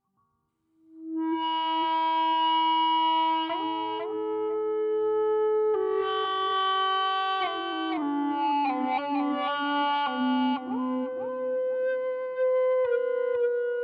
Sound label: Ebow; Mille Regretz